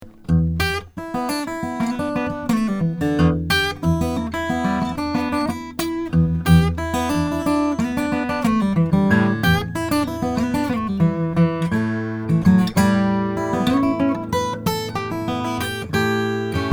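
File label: Taylor 615ce acoustic guitar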